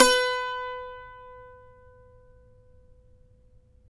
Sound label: saz; Roland - LA Composer vol. 3